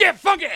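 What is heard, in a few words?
RX PERCUSSION; Kid Nepro; Elite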